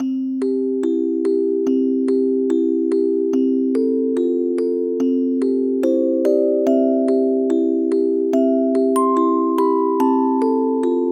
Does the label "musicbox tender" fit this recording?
Yes